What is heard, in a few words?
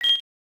Mining tool change; Pokemon Essentials; PKMN Estelar 1.3